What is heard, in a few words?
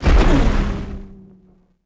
rico hangar Laser; impacts explos